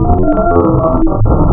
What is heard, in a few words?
KORG VS, wavetables, Classic Synths